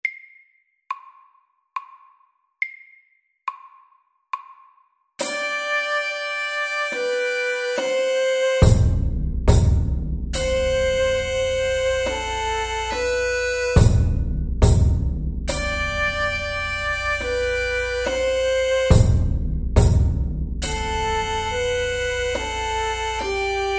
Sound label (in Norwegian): Stomp SAKTE